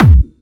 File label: Clubby Kicks, Bassdrums